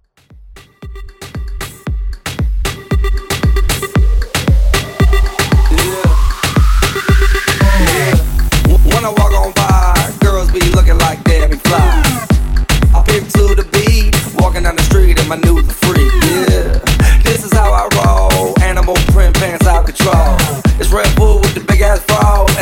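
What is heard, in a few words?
Moombahton 115BPM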